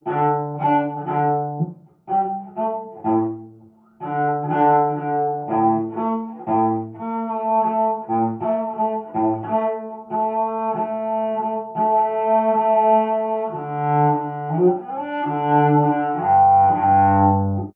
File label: Contrabass; Assassin's Creed Syndicate